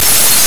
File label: zap